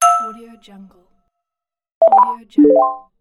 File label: notification